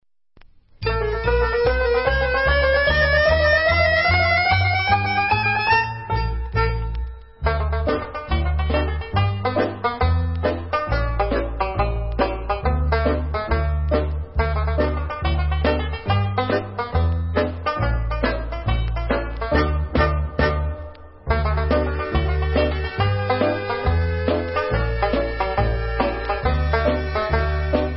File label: Cowboy Mixer, Instrumental